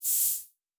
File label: Air Hiss, Sci-Fi Sounds